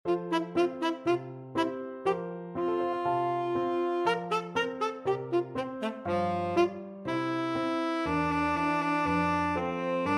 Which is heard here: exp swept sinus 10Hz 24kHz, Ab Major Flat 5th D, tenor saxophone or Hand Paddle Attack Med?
tenor saxophone